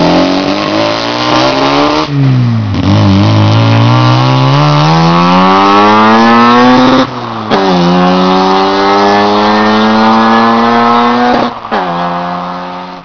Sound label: bridgeport, Rotary Sounds; Rotary Sounds and Video